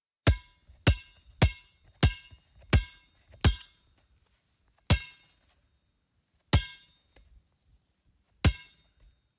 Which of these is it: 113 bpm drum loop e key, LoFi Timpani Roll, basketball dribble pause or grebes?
basketball dribble pause